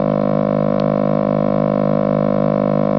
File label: dialtone